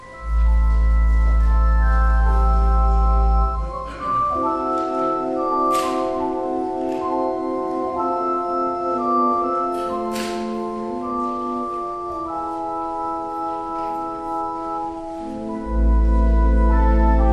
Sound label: Offertory Organ